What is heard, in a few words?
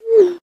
receive; SMS